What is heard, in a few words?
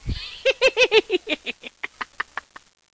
alert